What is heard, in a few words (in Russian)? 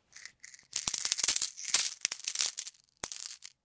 zvuk melochi